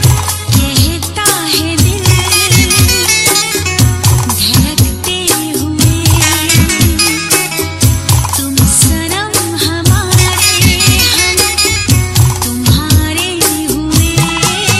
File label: Song Ringtone